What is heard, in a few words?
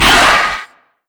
pain, antlion; creatures; vox